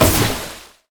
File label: hydro blast; LoL; nami koi